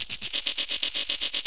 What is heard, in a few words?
Clicking Mechanical Rattle Glitch